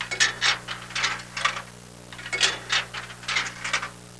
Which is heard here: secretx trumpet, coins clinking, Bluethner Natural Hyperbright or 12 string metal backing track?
coins clinking